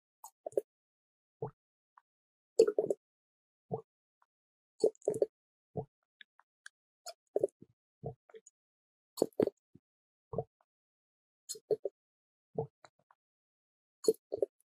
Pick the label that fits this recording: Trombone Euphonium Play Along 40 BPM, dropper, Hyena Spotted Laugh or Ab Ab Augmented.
dropper